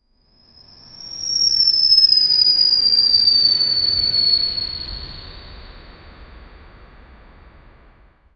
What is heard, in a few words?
a twinkling sound from th, sky